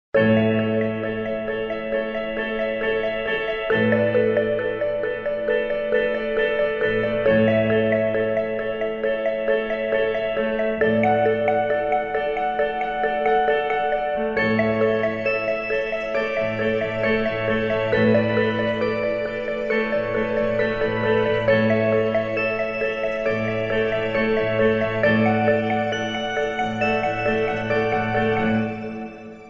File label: Samsung Galaxy 10 Ringtone; Sky High